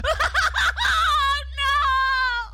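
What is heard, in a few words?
death scream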